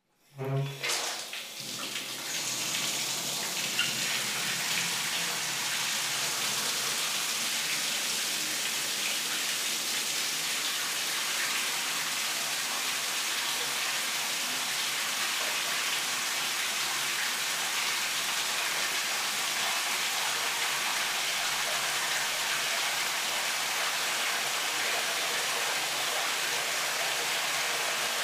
bathtub filling

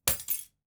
keys drop

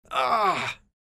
"ahhh", people sound effects

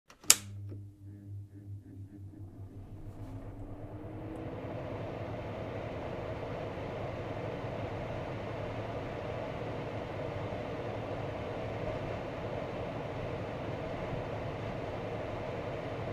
kitchen exhaust

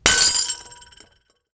glass breaking

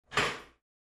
bathtub lock

